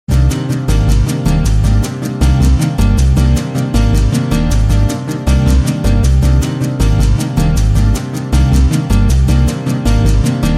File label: Ambient Chillout; Ringtones